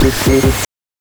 BANK2 SFX, NES DPCM; MIDINES

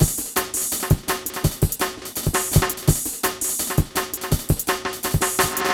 PHATT BEA

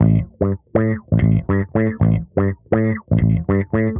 Bass 120bpm, A, dusty funk

